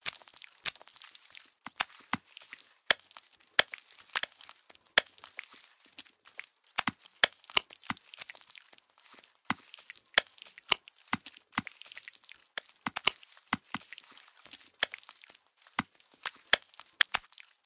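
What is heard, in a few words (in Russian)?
эффект грязи падает и падает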